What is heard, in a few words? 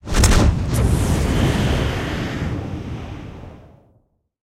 killscene shot, tanks; War Thunder